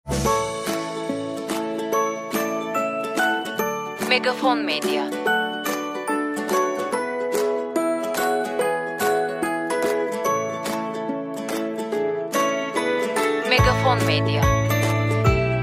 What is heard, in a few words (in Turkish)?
SANTRAL MÜZİK